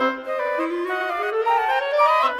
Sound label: Winds, Orchestral; Sonic Foundry (Sony Creative Software); Orchestral VOL-2; Modern 18